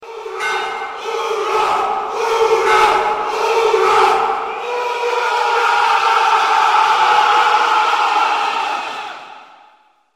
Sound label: Hurrah